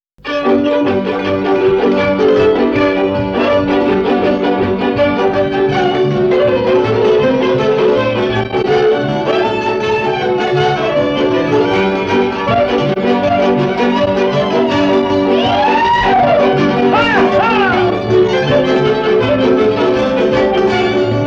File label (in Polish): Kapela